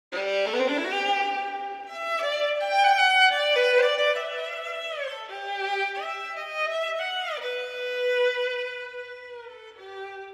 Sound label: ViolinSolo